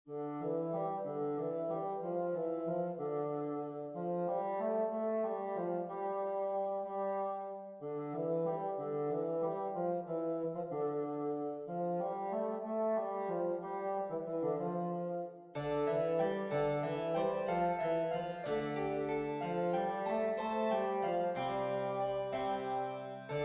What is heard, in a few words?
duet cembalo fagot; Levende musik for boern